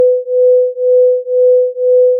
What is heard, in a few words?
beats; intonation